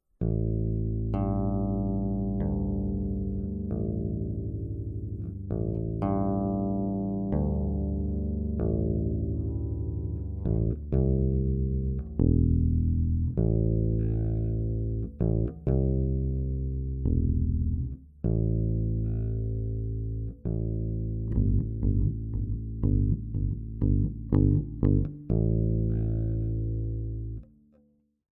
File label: bass line